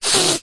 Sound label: human sniff hard blocked nose; zapsplat